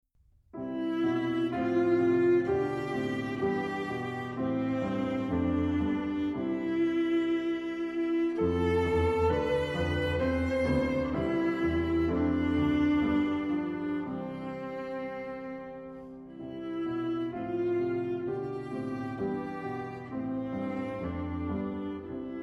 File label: Chorale Viola and Piano